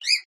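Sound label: Parakeet Sing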